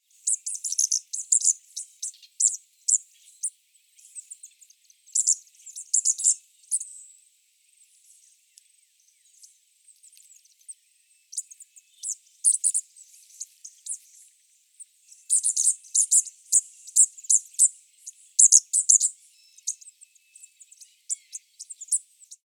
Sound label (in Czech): modropláštník nádherný Malurus cyaneus